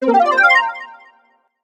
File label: kudos level; Brawl Stars